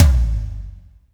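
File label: tom, real mega drums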